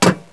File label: metal